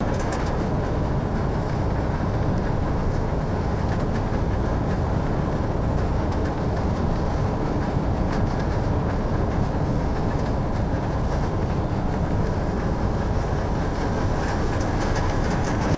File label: whistling wind inside a parked bus